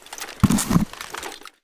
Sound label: mask use; STALKER; IWP; item usage